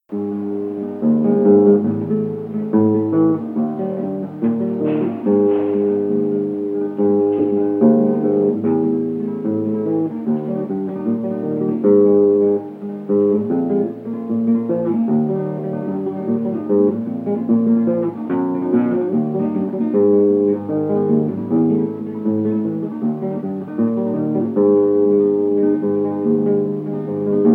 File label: instrumental